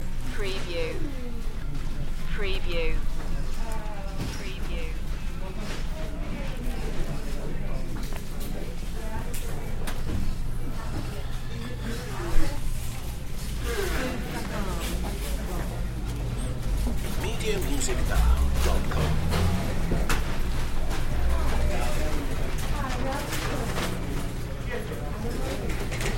Grocery Store Interior, Light Crowd Near Checkouts